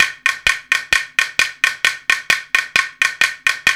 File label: 130 Hawaiian, World Dance Beats; Spectrasonics - Supreme Beats